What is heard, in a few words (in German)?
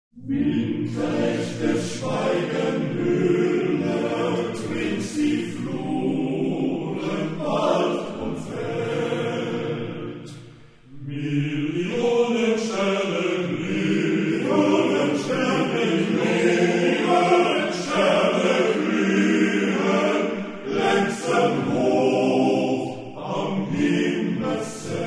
weihnachtsglocken, maennerensemble